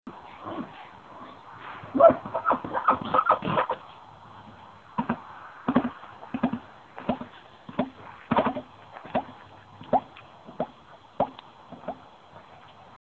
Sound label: Chuckle glunk